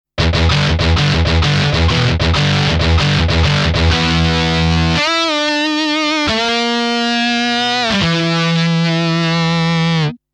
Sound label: GTR